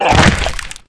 Gib

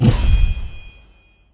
barrier on

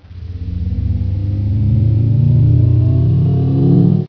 Power Up; Marisa Kirisame

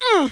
land; Marisa Kirisame

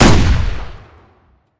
fire, explodium gun

